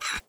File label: load, schrck; svencoop; ins2